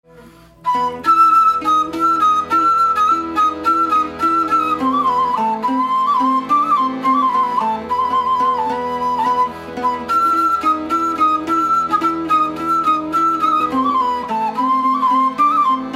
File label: macedonian gypsy quintet